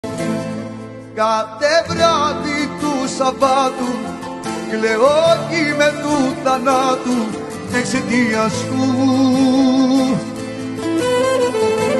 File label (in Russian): krasivaya greceskaya pesnya